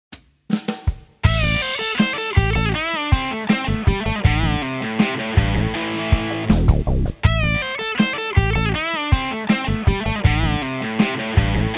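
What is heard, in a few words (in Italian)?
Pentatonica minore con tre note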